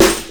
piercing boom bap hip hop snare drum sound e key, Royality free snare tuned to the E note. Loudest frequency: 2804Hz